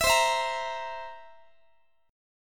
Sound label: C 7th C, Guitar, chord; strummed; x,x,19,22,20,21; 4A,4E,5A,5D,5G#,6C#